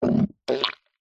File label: cartoon squelch wet poop fart deep; zapsplat